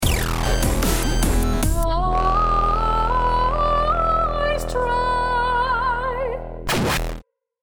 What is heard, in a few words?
lost round, music